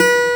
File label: ACGUIT E3, BassGuitar; AKAI S6000 CD-ROM - Volume 1